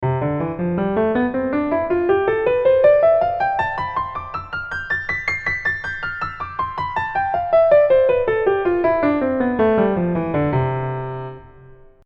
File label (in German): Tonleiter ohne Begleitung, piano